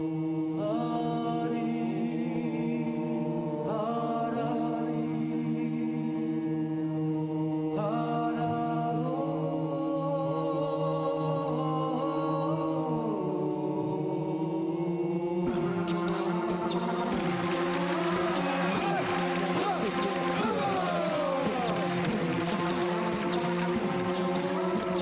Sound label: Georgian Remix